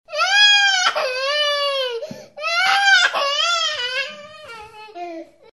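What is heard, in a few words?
baby sounds; zvuki rebenka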